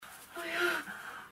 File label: OH YAH